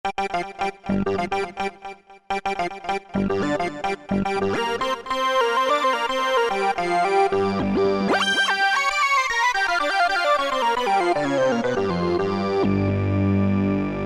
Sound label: led, E-mu PK-6; HippoSauce